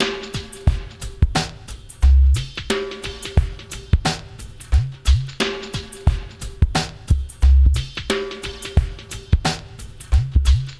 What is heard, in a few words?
REMIX, SWINGTOMS; Spectrasonics; Liquid Grooves 1 & 2